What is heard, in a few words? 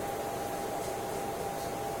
monokoza aircon; tetsuon